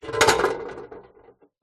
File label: foley metal box small empty set down concrete